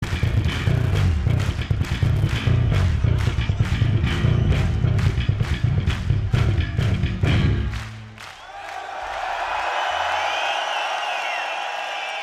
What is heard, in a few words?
Bass Solo